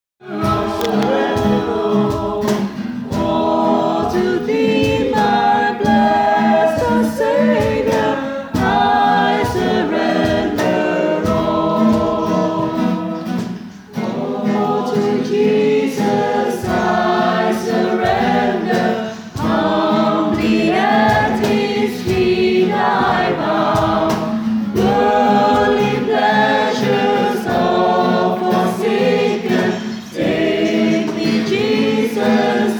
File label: alto